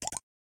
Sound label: Push Notification; Yota Yotaphone 2